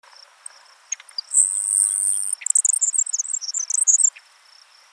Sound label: Grasshopper Sparrow Ammodramus savannarum; sparrows